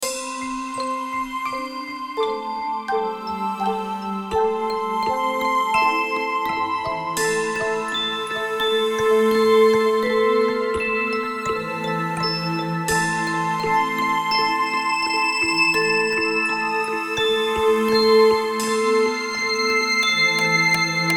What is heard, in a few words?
Sparkling bells